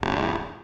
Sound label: casket lid